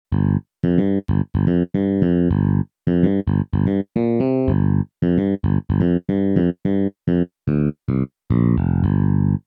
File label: Casio SA 80; Bass